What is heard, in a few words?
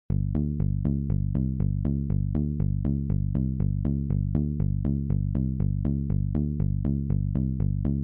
Solo